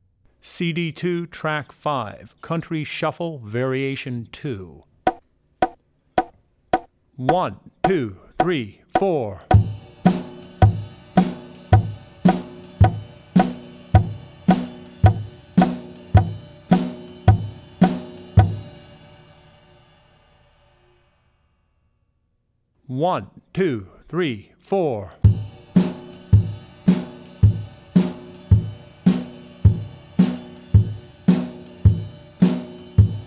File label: Country - Country Shuffle; Drummer's Bible Tracks